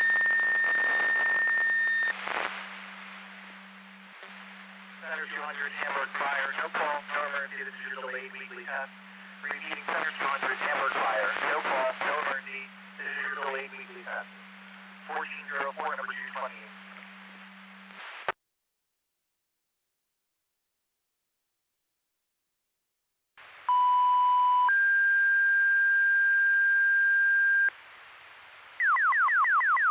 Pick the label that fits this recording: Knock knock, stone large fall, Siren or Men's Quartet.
Siren